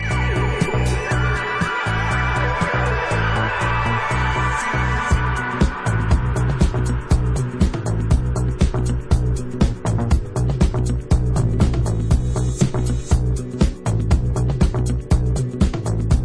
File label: instrumental